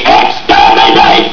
jump; quake2; dalekx

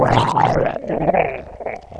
drown; quake2; fangrin

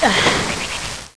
gurp; quake2; link

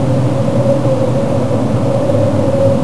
winter wind; quake2

